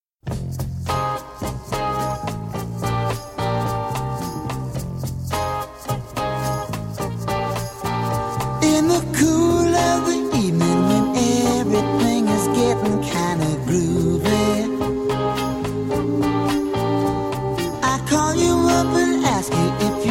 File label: spooky